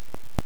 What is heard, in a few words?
pad tape big hit; piezo ddr controller